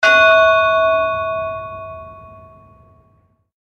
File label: bell; super skelemania